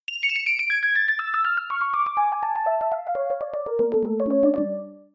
synth piano soft piano

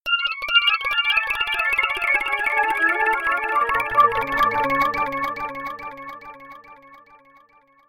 arpeggios hyperorgan

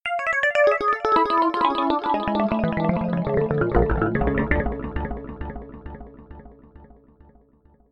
arpeggios arpeggio

